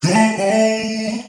Vocal one shot A

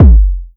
Kick Crushed, 808 Kicks; Slate; Vintage Drum Machines Volume 2 808 Edition

